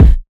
short kick drum a sharp key, bass drum single hit tuned to the A# note; kick drum samples